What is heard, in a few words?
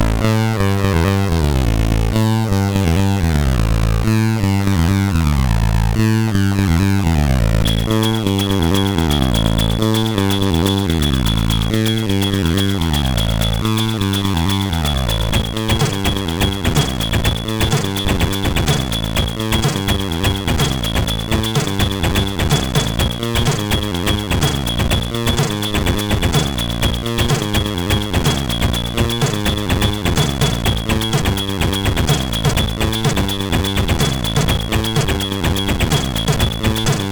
Drum Beat sid CSG8580R5; hvsc